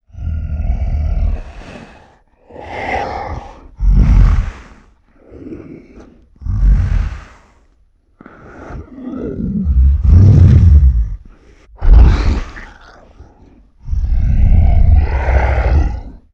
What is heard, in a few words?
Snarling & Breathing, Vocals - Monster; Sony Pictures Sound Effects Series